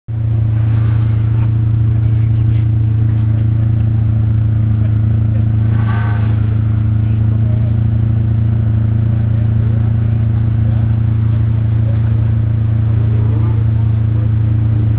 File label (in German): Ferrari 550 Maranello Standsound